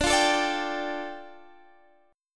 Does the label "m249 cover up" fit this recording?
No